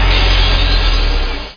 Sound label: UNCLOAK